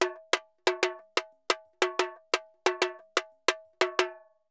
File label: Tamborin 120; Best Service; Festa Latina VOL-1; Drums & Percussion